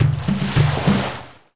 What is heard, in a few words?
boat war; 帝国时代+罗马复兴